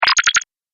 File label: Laser Lock; multimedia